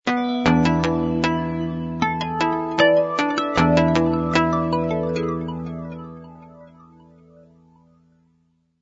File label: Music Theme